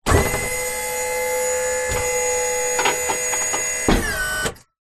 truck ramp tail lift motor whirr metal clangs, vehicles